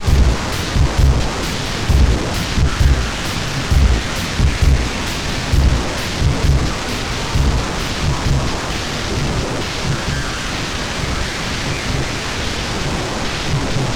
Erica Fusion Plasma Drive Track Dry Wet 50 reducing In Level